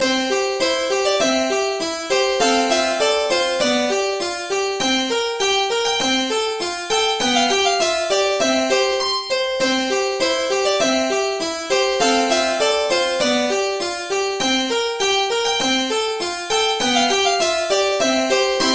Croquet Background

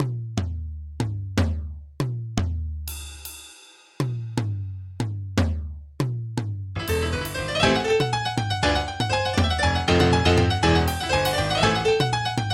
Jazz

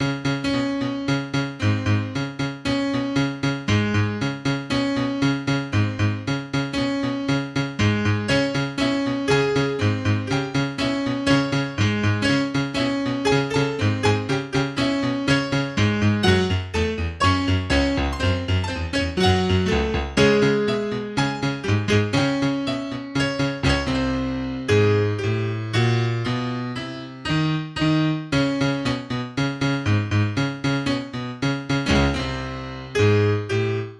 Piano; The Simpsons

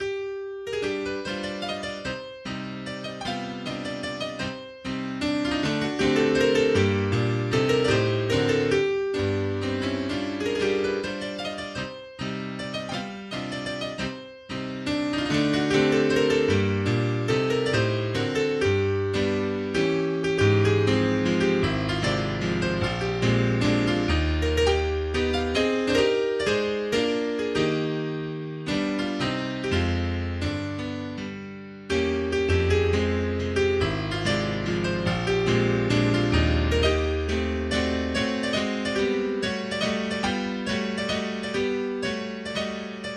Mazurka in C Minor Allegro non tanto

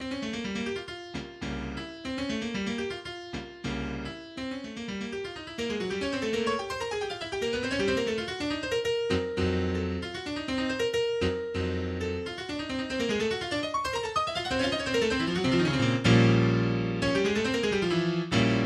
Prelude in F Minor

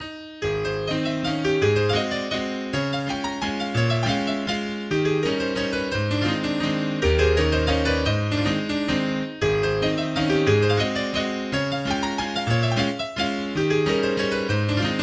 in C Sharp Minor Allegretto; Mazurka No29; Opus 41 No4

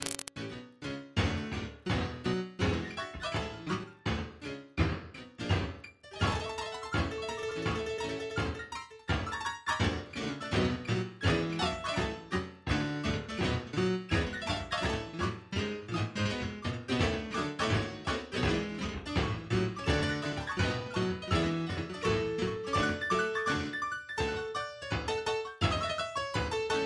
Instrumental; Mickey Mouse Clubhouse; Converted MIDI

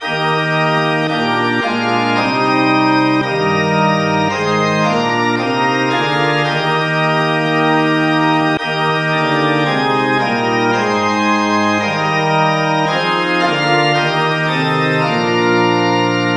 Organ Cover

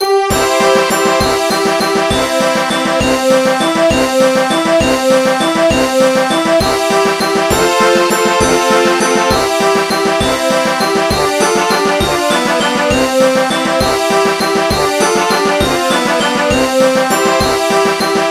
Denmark flag anthem; Europe; Fanemarch

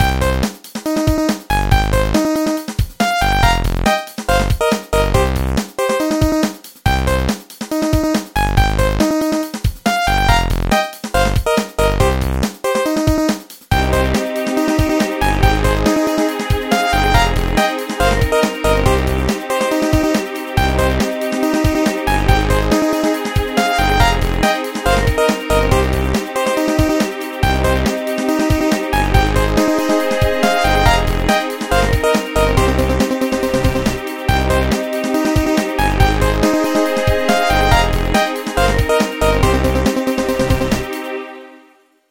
Menu Screen

